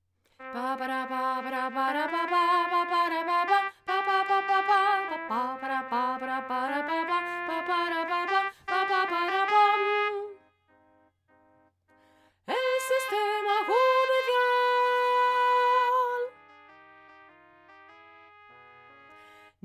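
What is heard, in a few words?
soprano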